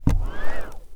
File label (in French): SOUFFLET